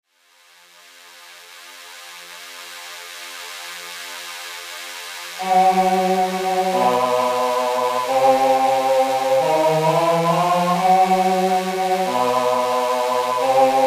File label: throbbing